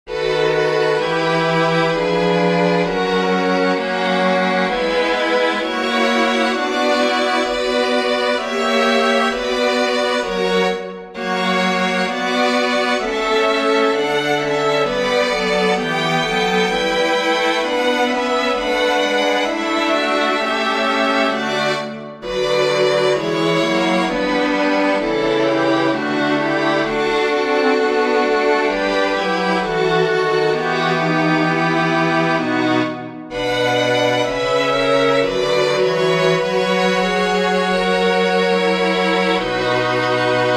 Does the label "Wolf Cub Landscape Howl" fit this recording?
No